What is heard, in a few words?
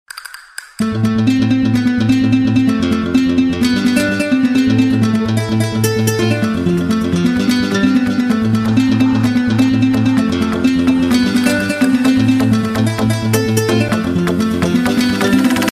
flamingo ringtone